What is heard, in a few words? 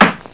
build; zrush